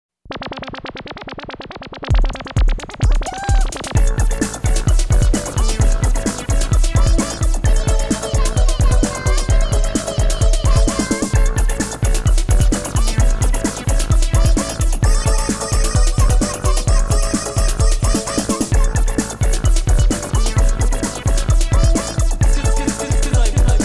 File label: (Synthesizer Version); Super Famicom Magazine Volume 14